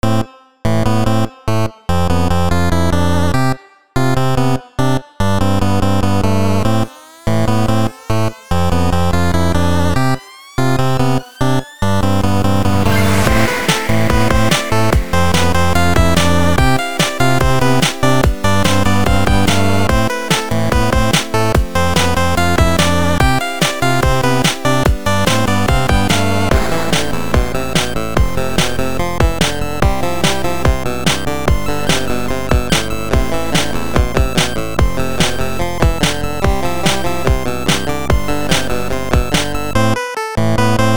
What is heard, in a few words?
Jump 8bit Music